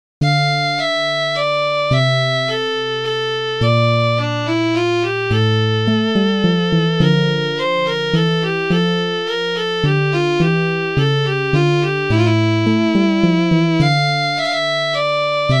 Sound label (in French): menuet sol mineur; JSBach